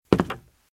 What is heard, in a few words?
Footsteps - Wood - Creaky - Walk - Left; RadwinIsland